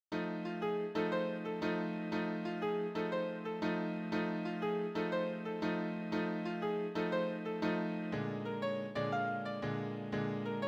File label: Simple Blues In F; 16Blues